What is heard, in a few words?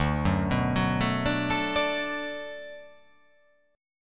D 6th D down, chord